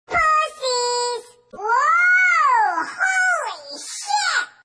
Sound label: sms mult